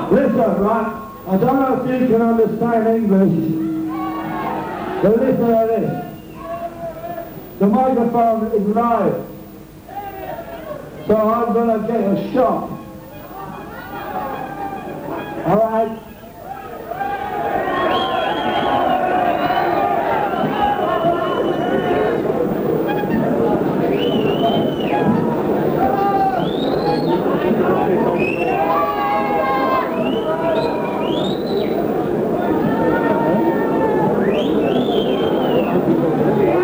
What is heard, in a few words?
Microphone Change; Kaufleuten Saal, Zürich